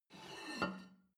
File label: plate take table slide, gastronomie; berufe; soundpool